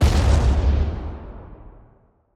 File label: plane explodes; Tailgunner